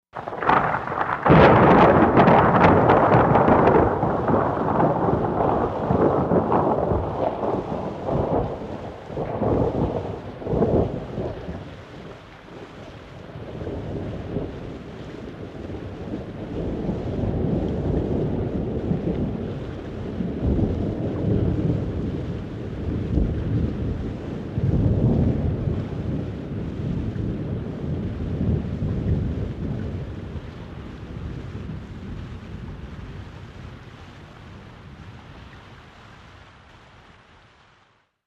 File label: Strong thunder clap with long roll, light rain